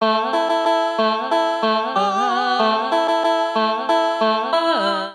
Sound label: Combined Rack A Minor 93 bpm; MIDI Clips; Ableton; Factory Packs; Voice Box; Harmonic Racks